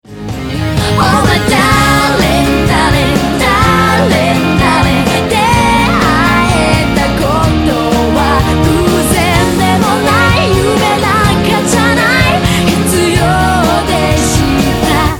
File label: music